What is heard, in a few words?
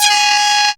CREEPY G#4, sequencer; Best Service Alpha Dance vol.2